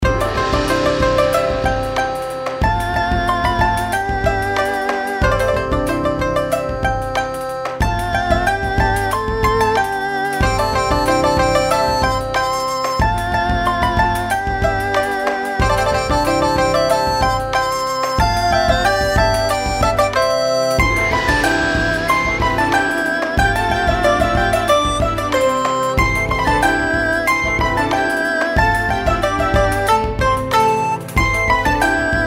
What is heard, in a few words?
reelspin fg; Yu Gong